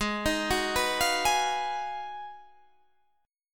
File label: Ab Minor 13th, Ab, Guitar, Drop D